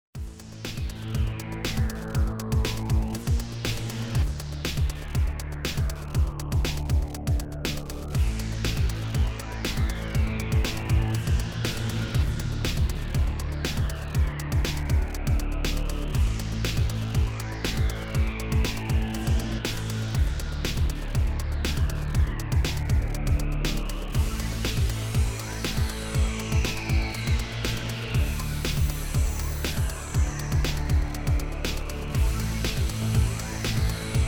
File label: Rockin Monsters Game Menu